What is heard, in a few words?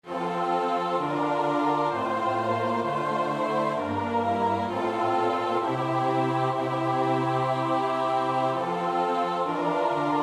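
soprano alto tenor bass; Bach (Johann Sebastiaan); BWV 146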